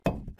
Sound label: Impact